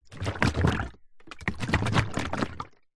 industrial power steering fluid in plastic bottle short shake movement